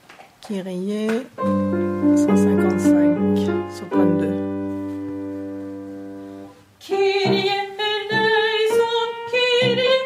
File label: kirie mezzo